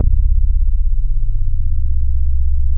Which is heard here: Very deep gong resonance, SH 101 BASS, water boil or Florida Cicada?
SH 101 BASS